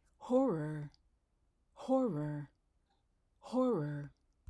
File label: horror